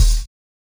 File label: BD; Best Service; Dance Mega Drums